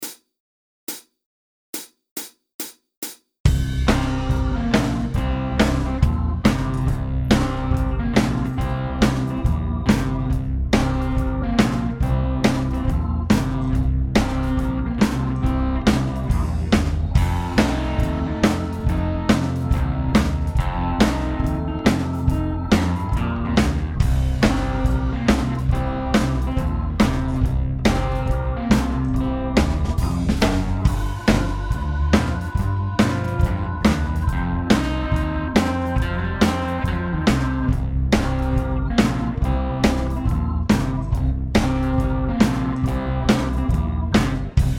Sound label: Southern Rock Blues C70; backing Tracks